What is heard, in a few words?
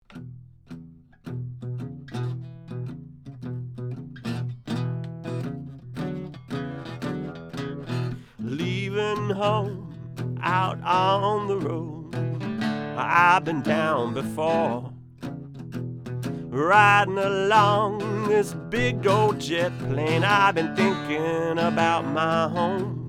Jet; U47 Kit ARange